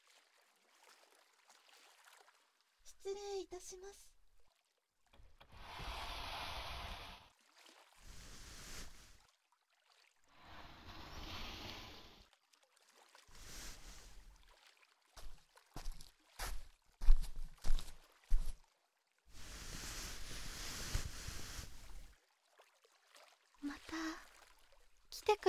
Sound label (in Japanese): 耳かき, ASMR; 耳かき処 せせらぎ～ヤンデレ同級生 檜苗乃編2～